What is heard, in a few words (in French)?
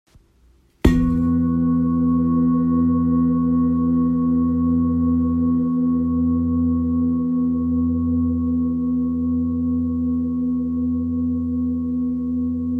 Gros bol bengale fa 7.2kg